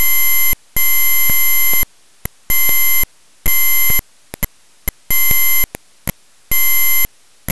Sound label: tones and glitches